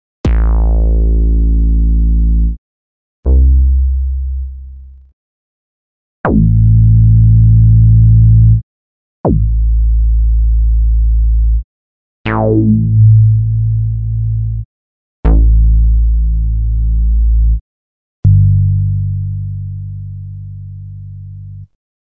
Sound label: Various Bass Samples